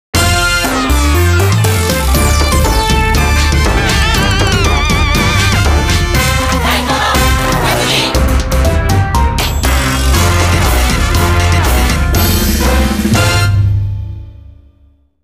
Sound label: Openning BGM; ps2; Taiko no Tatsujin 9